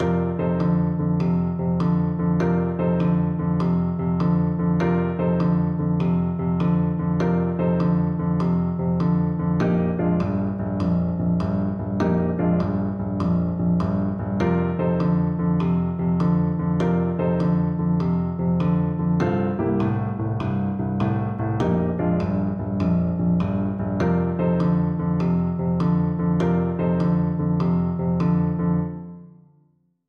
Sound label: Blues Piano